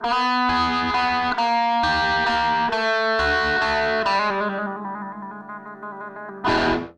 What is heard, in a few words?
end guitar